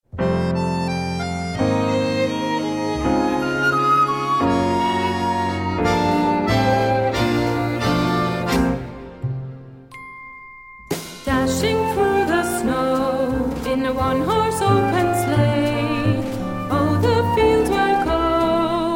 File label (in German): Weihnachtslieder fuer Kinder Jingle Bells